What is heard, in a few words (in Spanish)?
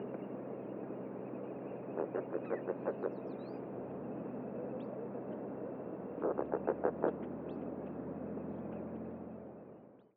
Espatula Rosada